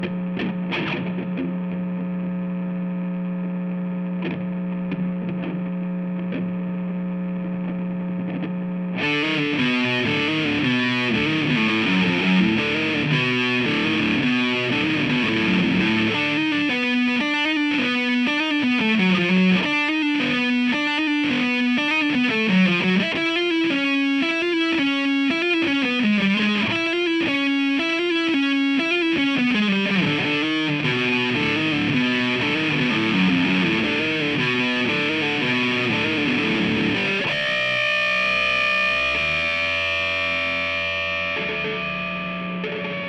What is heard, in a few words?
GTR